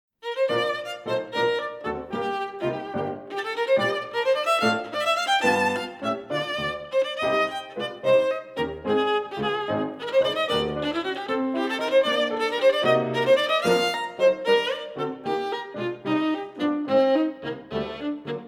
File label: classical violin & piano